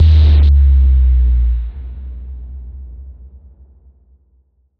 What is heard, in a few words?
Bass Noise